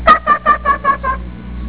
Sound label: hahaha